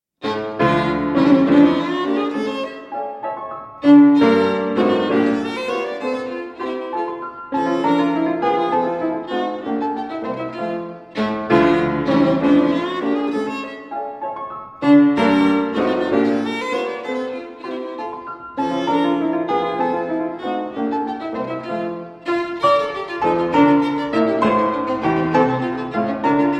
Violin Sonata No. 2 in A Minor, III. Menuetto. Allegro